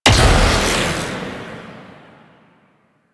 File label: Mag Crush; Warframe